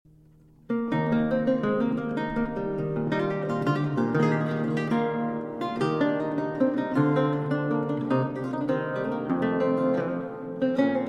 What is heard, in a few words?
Cello Suite in G Major, Gigue; Cello Suite No. 1; BWV 1007 VI.